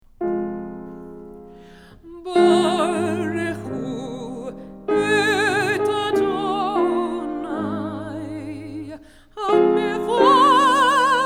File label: Barchu morning